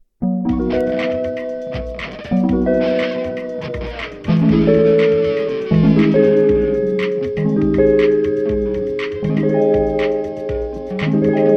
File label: Victory; Hylics